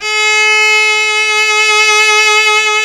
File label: Synclavier Strings; ILIO